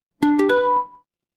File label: ui alert